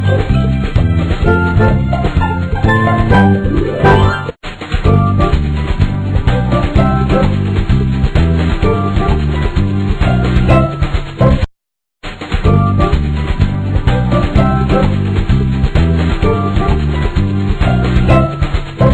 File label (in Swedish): diktatormusik